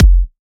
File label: EDM Future Kick; Big EDM; Future House Tools; Kicks